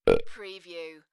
Short burp; beanstalk audio

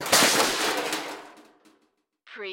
Crash Metal Debris

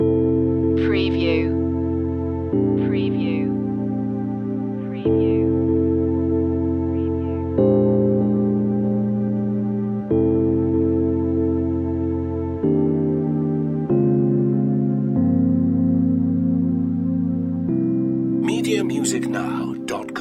spa relaxation loop

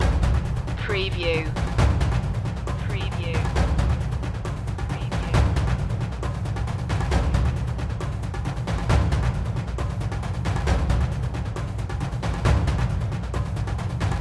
cinematic emotion loop

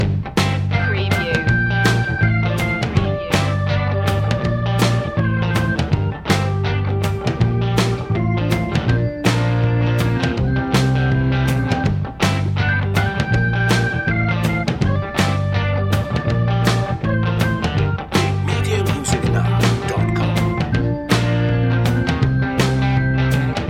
vintage blues loop

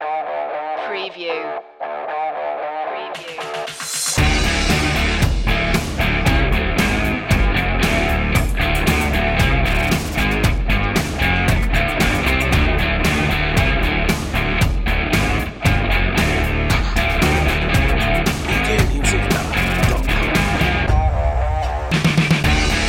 hard rock

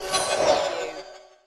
OverTake

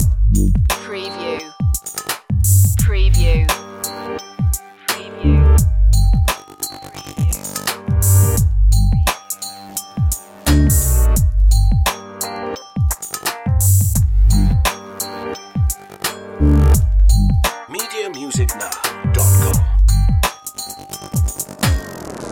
present tense loop

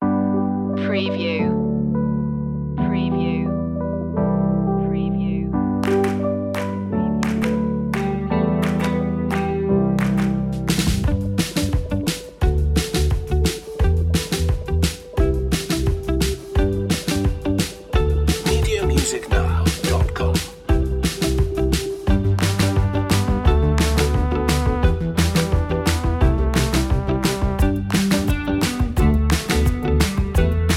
no vocals

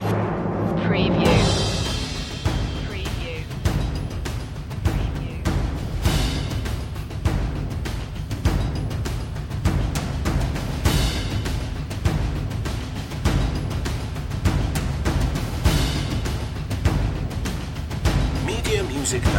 perc mix

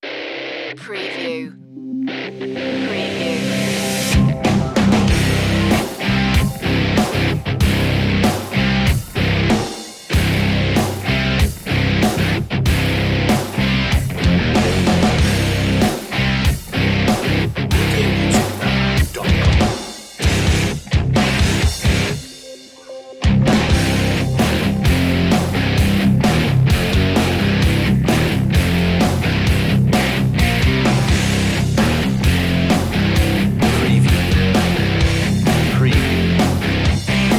attack of the guitars; 60 sec

